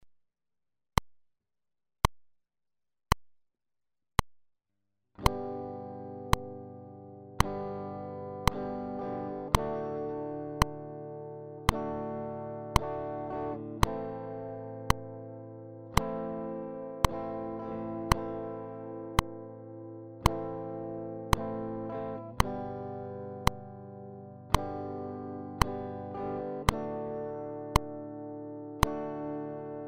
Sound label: Chord C, guitar; Chord Pro; guitar beginners